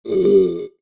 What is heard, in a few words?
Burp